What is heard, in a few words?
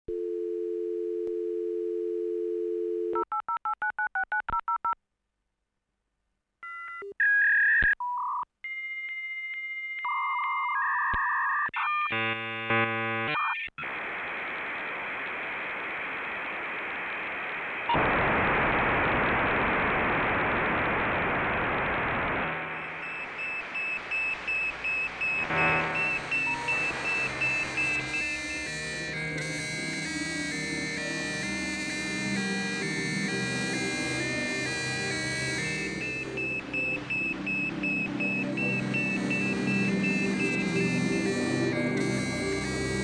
pulse